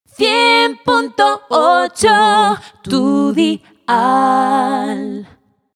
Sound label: cantante jingle spanish singer, Dial cantando; 100 punto 8